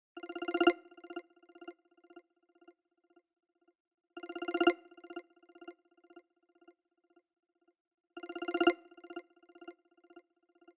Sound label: tilt; nada dering iphone